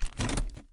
Shift To Reverse; Lethal Company

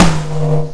Tom (B3); Guitar Rock Tour

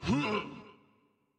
Attack; Dinkubus

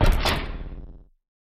PickUp Warhammer

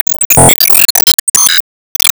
Enemy Time Magic Active Clock Miss; disney star smash android ios mobile gamerip 2020

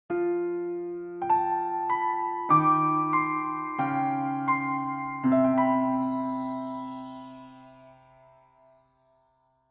sleep jingle; Cris Tales; PS4 PS5 Stadia Switch Windows Xbox One Xbox Series X/S

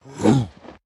Throw; Baron

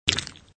bloodshoot; SFX Monsters

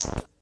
Ship Magnet Off; Lethal Company

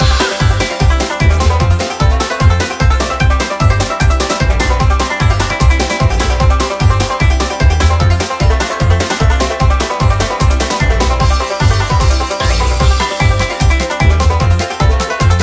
Goldmine Loop

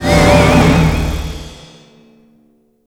Lucky Stinger; Ben 10 Protector of Earth; Wii

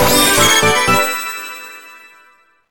AUDITION SUCCESS; gamerip; PS3

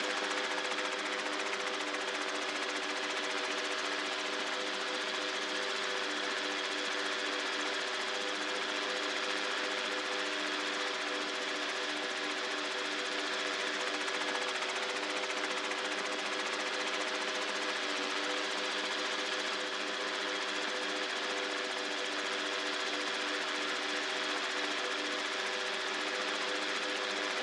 Drone Movement

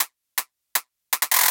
Fill 160BPM

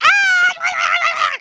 Scream

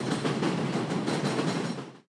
Train Passing Idle; subway surfers city sound effects

